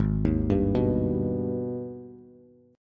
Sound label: Ab Minor Major 7th, down, Bass; arpeggiated; AbmM7